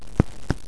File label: step